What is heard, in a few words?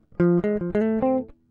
Guitar Jazz